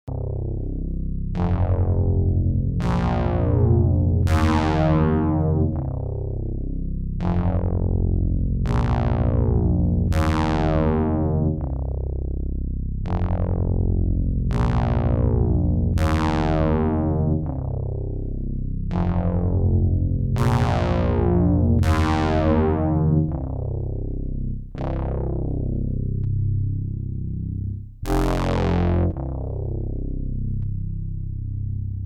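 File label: Brass Ensemble poly mono chorus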